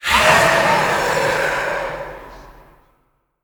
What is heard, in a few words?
harvest souls; sfgame; underworld